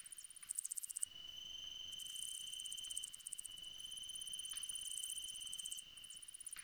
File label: Critter Loop, Ambience; Glades Of Andurin